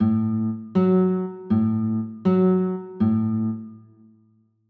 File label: a 6, chords